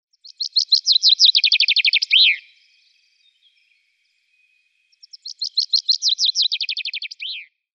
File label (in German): Buchfink Fringilla coelebs; Vogelstimmen